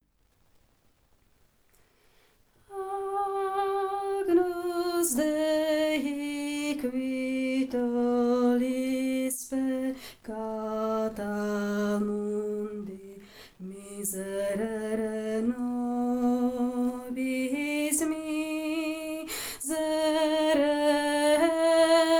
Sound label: bass; Farnosť Letanovce